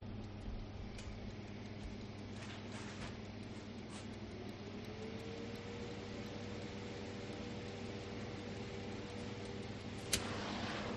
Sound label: industrial oven fan noise with timer